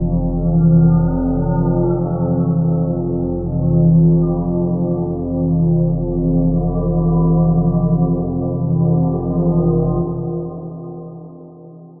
drone doomer F, drone pad texture

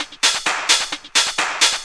drum 130, techno trance drumloops

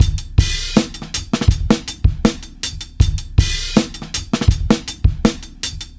Live Beats, R&B Drums

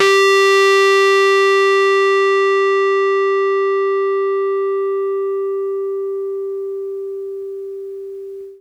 RHODES FUZZ

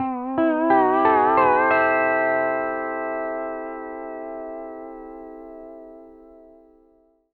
LOOPS 140, Country

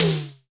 SDS5 snare hi, Simmons; Beatbox; Akai MPC-500

